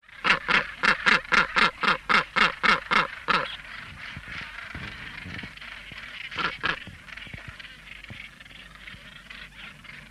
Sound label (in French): Fou de Bassan